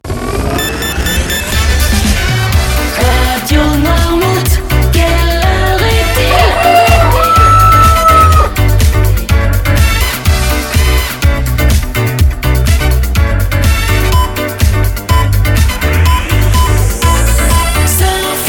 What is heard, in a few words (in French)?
COQ